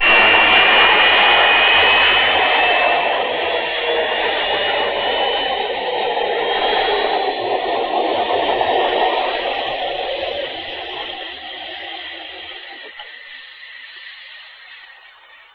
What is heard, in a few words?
takeoff; Sonic Foundry (Sony Creative Software); Ugly Remnants VOL-2; UR 2 FX (Long)